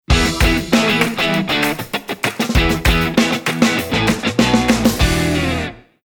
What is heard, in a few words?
funky groove and claps ident